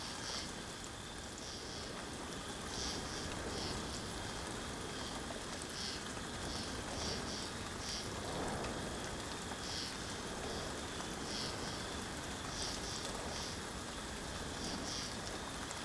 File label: ambience coniferous forest night breeze drizzle; Rust